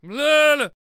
Brawler Death Vocals

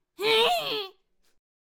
Swordcaster Attack Vocals

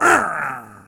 Tempest Lich Attack Vocals

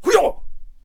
Hwacha Attack Vocals

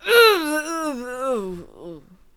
Bomb Thrower Death Vocals

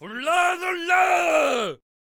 Brawler Attack Vocals

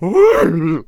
Bard Death Vocals

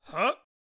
Teacher Attack Vocals

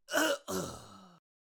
Teacher Death Vocals

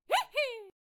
Hay Baler Attack Vocals

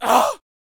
Attack Vocals; Harpooner

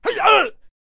Attack Vocals; Spear Thrower

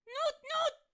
Fan Bearer Attack Vocals

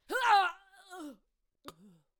Exiled Sentinel Death Vocals

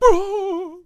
Healer Attack Vocals

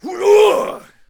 Hwacha Attack Vocals

